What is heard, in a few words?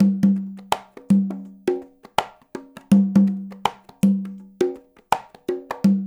LOOP, CONGAS, Percussion; InVision Interactive; Percussion Slam